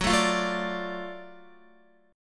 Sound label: Gb Minor Major 7th Sharp 5th Gb down Guitar; strummed; JGuitar